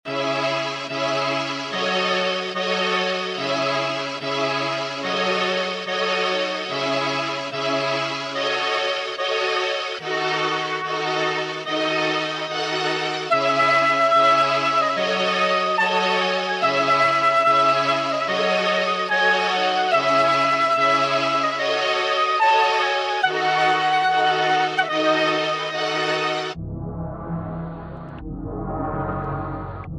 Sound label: 145bpm C minor